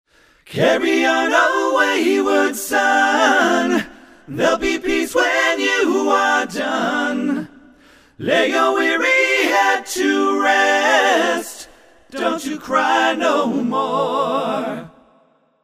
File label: Intro Acapella